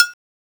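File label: PERCUSSION; 727; E-Lab; X Static Goldmine 1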